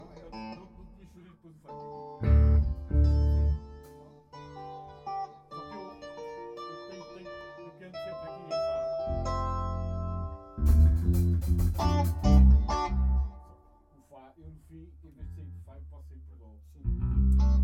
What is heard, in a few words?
Funky part